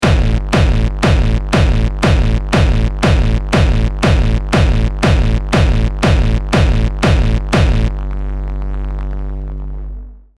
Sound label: RAW Kick